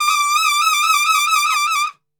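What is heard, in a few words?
D trumpet shake; Best Service; Brass Super Section; TRP SHAKE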